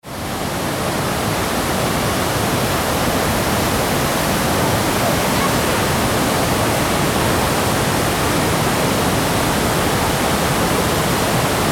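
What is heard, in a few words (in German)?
Gulfoss und Stimmen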